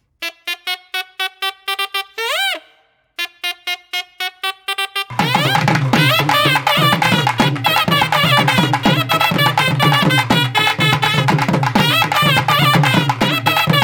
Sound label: Instrumental; Karnan